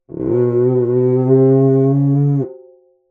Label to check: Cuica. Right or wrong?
Right